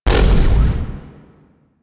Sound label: Shotgun Proj Fire; Weapons; DE; Warframe; Tenno; Pod Shotgun